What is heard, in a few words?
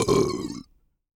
burp; AKAI S-Series CD-ROM Sound Library VOL-6; HUMAN SE